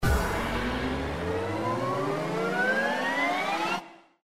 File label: ANTICIPATION, SLOTS; Magic Coin